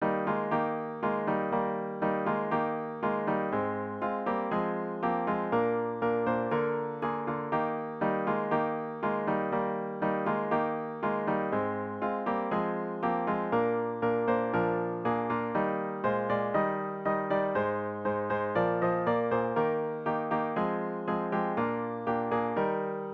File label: midi